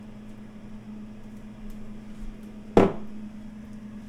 Setting Pint Glass Down

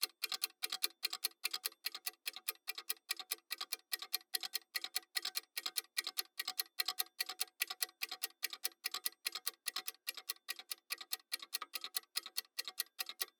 Timer Running Close Up

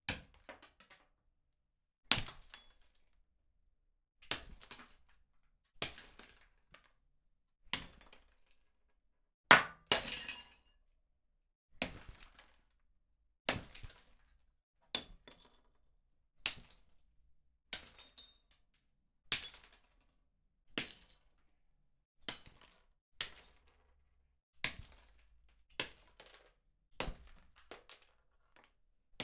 Glass Crash

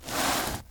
Drag Book Wood

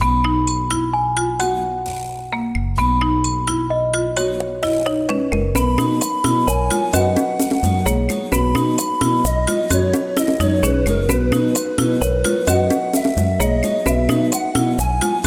Song Loop

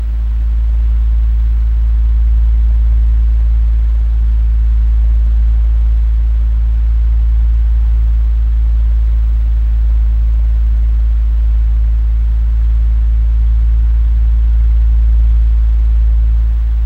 Fan

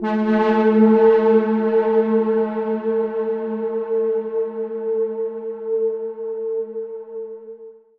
Steampipe Ghostwhistle G 3

